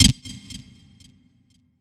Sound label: Pausemenu Appear